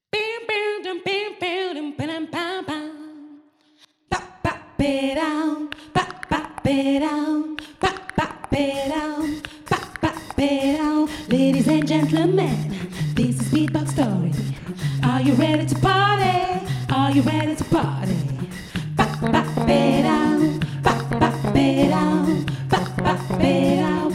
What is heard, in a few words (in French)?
BEATBOX